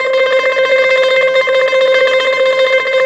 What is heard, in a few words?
ELBZ TREM, BOUZ ELTR, Mediteranean Traditions; USB Soundscan vol.53; AKAI